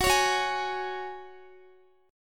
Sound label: Gb Suspended 2nd, Guitar 4E,4A,5D,5G,5C,6F; strummed